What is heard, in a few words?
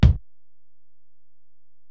Ac BD, Alesis DM-PRO; 300 Drum Machines